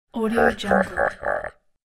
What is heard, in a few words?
bad creature silent laugh